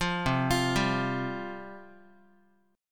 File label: C Suspended 4th C down Guitar; Csus4 arpeggiated; 8,8,8,5,x,x; JGuitar